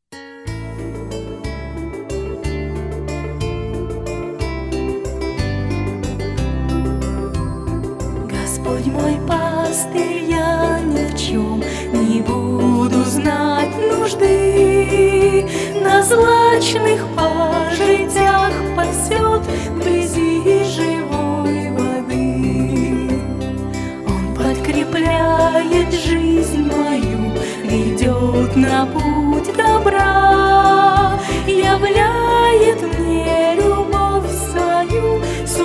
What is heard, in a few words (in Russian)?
Господь мой Пастырь с голосом